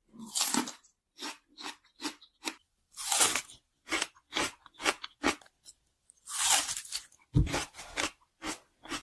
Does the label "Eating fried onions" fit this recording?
Yes